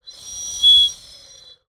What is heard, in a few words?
Bird whistle, clay, painted, dry, airy, wispy, swell, slip, med strong; Eiravaein Works; Vaeyan IV